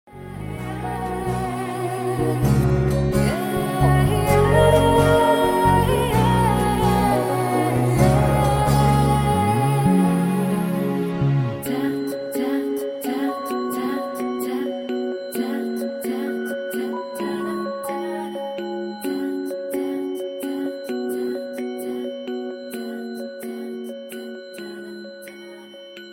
priyatnaya muzika

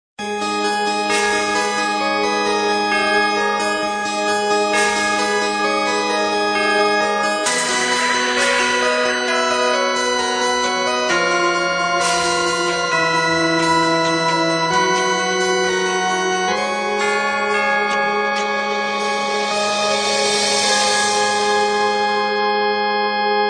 goticheskaya muzika

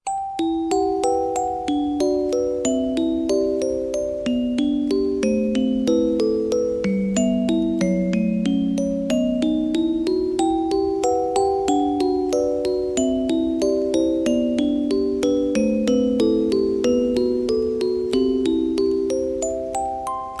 muzikalnaya shkatulka

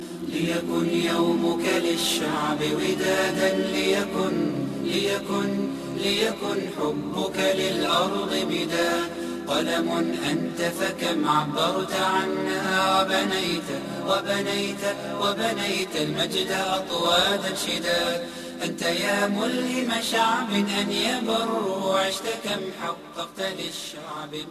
arabskij nashid

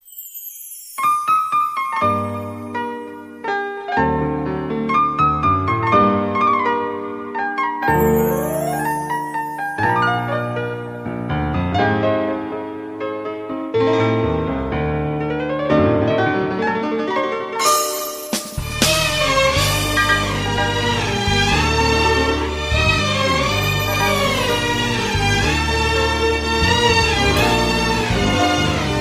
Игра На Фортепиано

